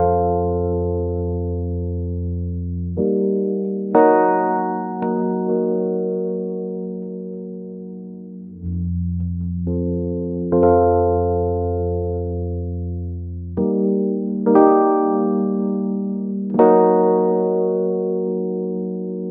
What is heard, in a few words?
warm melancholic minor lo, lofi